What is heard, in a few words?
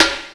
Snare, E-MU Proteus-2000